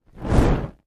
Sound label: Torch Movement; torches